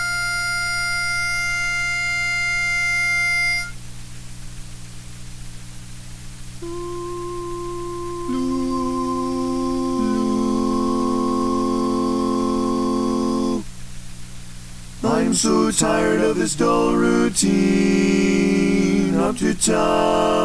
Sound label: bass missing